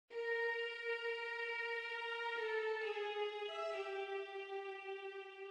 Ring Leitmotiv, mid

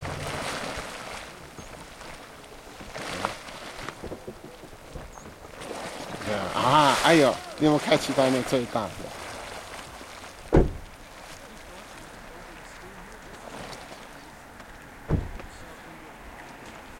Yellowstone National Park - Firehole Spring

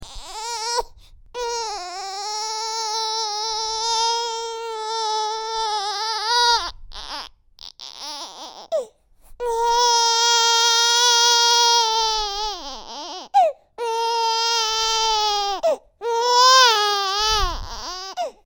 Baby long wanting cry

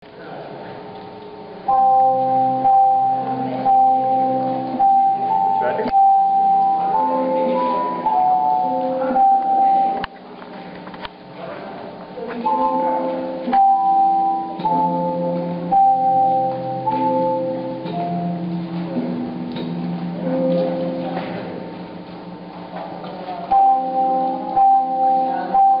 Great Stalacpipe Organ, Luray Caverns; Shenandoah

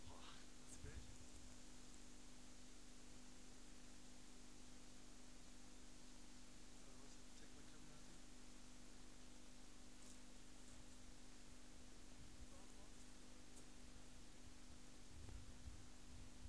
Turquoise Parrot

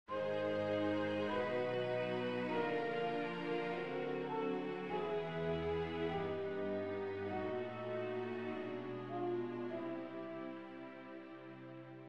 Ring Leitmotiv, mid

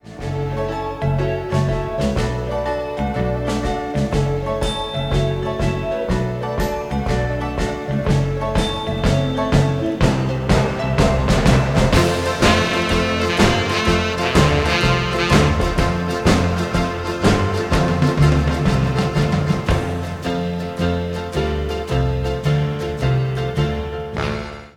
instrumental

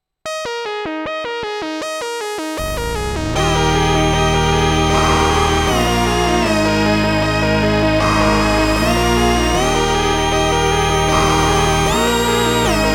Micromoog

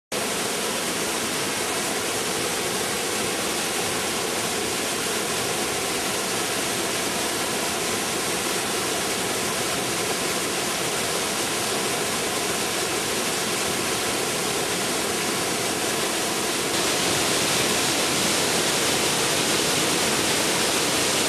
Morgan Falls; Chequamegon National Forest near Mellen, WI and Copper Falls State park

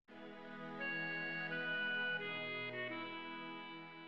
Ring Leitmotiv, mid

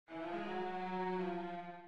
Ring Leitmotiv, mid

